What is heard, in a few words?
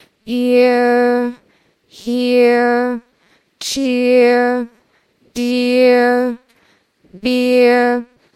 singing